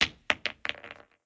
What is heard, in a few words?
Drop Grenade; blue archive